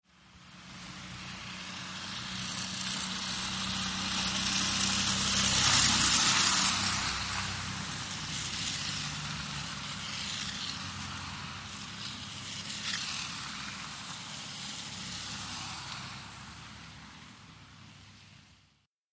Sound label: City traffic in slush, car passes with chains.; TRAFFIC WET TRAFFIC: EXT B/G